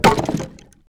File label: wood chop